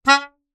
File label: Melodica Short Note